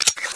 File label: arm; blobAndConquer; combat